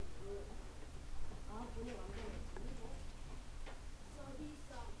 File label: strike